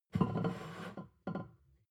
plate stack slide table, gastronomie; soundpool

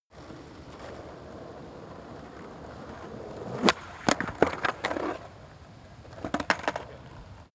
skateboard single drive fall off long

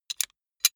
metal clicks; industrie wirtschaft; metall

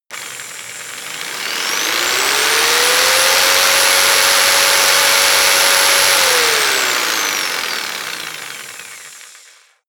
jigsaw engine medium fast long; maschinen; saege; soundpool

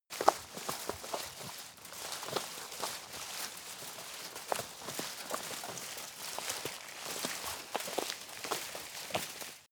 metal wire handling, Construction

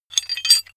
metal handling pieces

